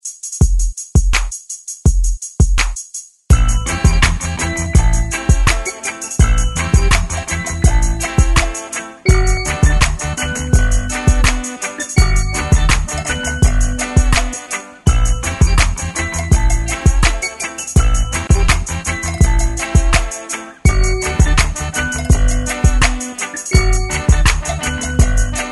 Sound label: ReDrum 83 Bpm